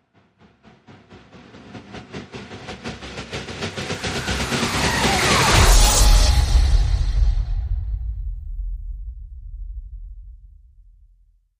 Rise Short Up the Tailpipe

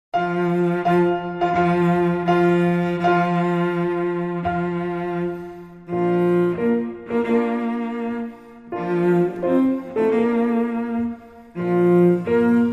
Bridal Chorus (piano and cello)

